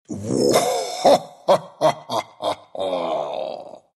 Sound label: laugh, ru; Dota 2